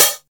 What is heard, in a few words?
Present Hi-Hat F# Key, Royality free pedal hi-hat one shot tuned to the F# note.; hat samples